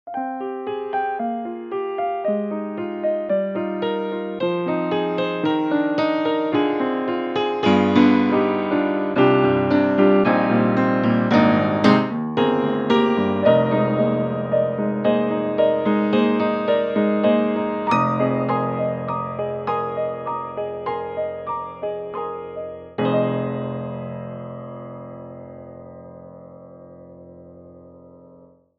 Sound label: grand Close